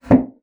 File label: bassoon slap; Xsample VOL-6; C BASSOON